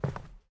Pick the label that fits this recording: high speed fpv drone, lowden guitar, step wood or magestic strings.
step wood